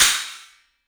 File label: cymbal stac; E-MU Producer Series Vol. 5 – 3-D Audio Collection; 3D Percussives